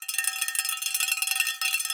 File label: Monnlight Shake